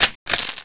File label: clip out; tfa cso; python